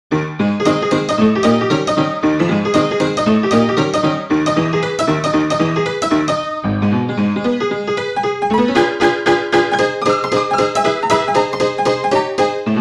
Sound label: jangle honky tonk piano